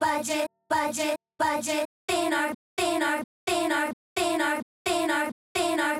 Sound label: vocals, 130BPM; Pony Fan Music Remix Stems